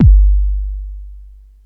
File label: smooth bottom end kick drum sample g key